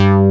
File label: SHARP BASS; Pro-Rec - Synthsonic; BASSES